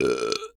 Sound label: Burp; zoomerang